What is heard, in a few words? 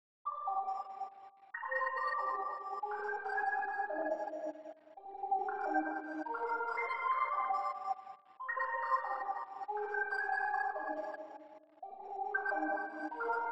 140 BPM C Min Synth; Melodies; Loop Stems; Cymatics; 2022 Melody Mystery Expansion; No Loyalty